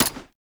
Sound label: Grineer Assault Rifle Mech; Weapons